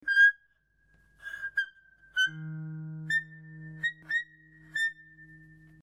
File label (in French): chiffon larsen, harpe